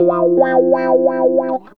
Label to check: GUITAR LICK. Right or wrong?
Right